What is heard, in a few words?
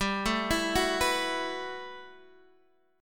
B 7th Suspended 4th G; arpeggiated